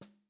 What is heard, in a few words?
snare pp, Snare; Membranophones; Struck Membranophones; Legacy Snares